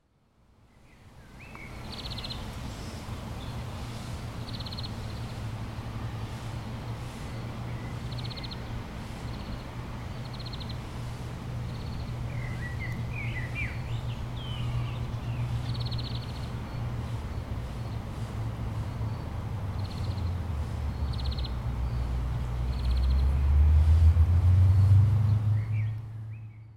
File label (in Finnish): viherpeippo; linnut